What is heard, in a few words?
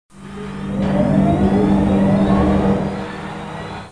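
elevator leave; Babylon 5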